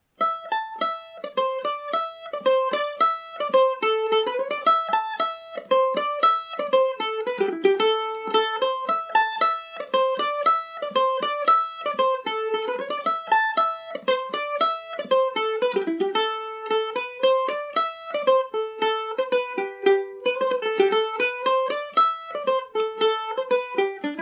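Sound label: march of the one legged men A dorian, mandolin; G&O #34 mandolin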